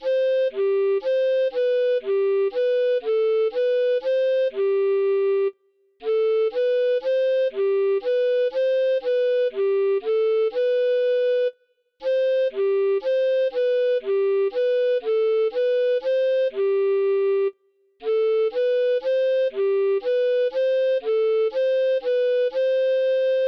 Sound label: Opera House Waltz, recorder